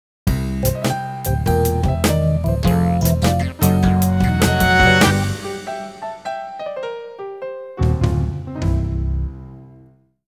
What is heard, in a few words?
Suburbia Zone Completion; frogger he s back